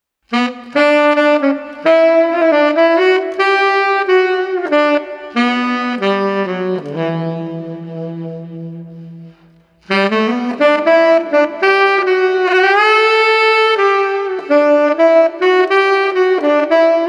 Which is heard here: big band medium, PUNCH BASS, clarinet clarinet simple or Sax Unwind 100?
Sax Unwind 100